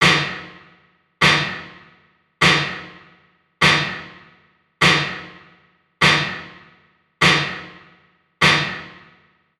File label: reverb